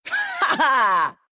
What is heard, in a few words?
laugh, ru; Dota 2